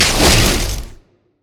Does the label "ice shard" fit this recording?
Yes